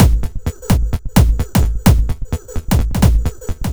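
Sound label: No snare, Drum Loops 129bpm; Zero-G; Soundclash Nu Dance Electro; Acidized Wavs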